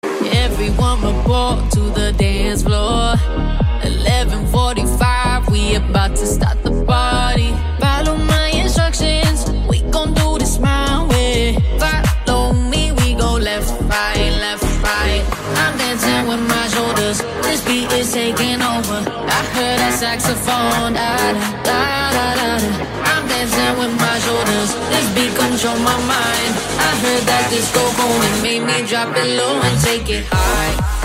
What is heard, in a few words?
disco